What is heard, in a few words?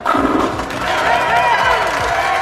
wii strike